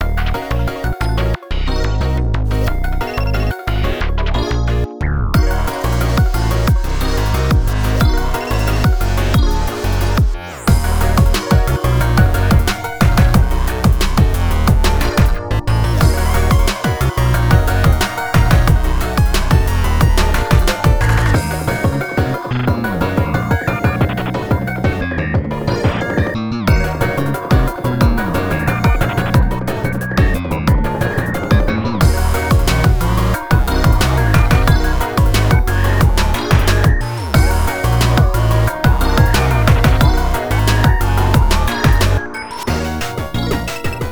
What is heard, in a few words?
Firewall (Instrumental); Friday Night Troubleshootin'